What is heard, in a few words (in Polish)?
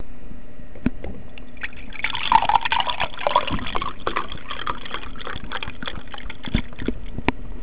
woda